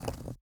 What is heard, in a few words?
ui pickup stone; Rust